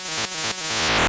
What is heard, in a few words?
daisy transform; Super Mario Land